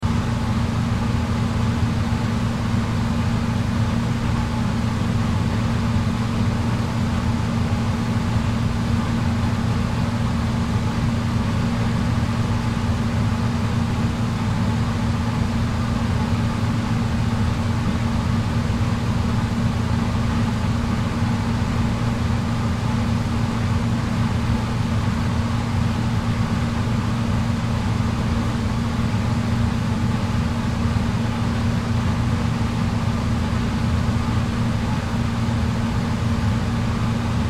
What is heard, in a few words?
Jacuzzi Room, Jacuzzi Room Ambience Run Jets Off Loop Indoor; Room Tone; Sports